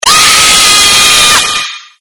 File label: scream; homoween; jumpscares